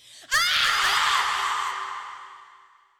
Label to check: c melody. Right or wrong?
Wrong